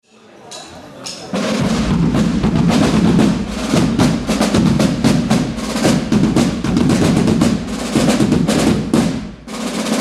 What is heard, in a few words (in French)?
Tambours